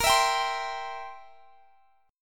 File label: C Major, chord; strummed; x,x,20,21,20,20